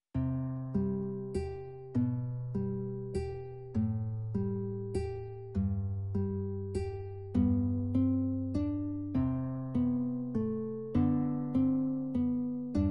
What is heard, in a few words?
accomp; beginner classical guitar lessons; i-m-i-m movement of your right hand